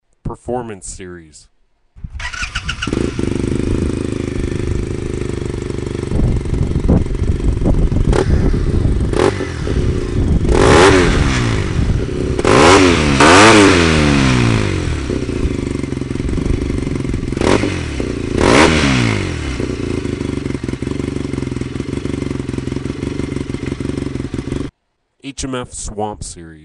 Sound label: arctic cat wildcat trail